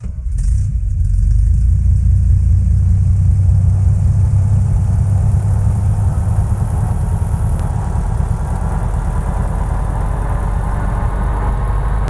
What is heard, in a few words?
startup; da40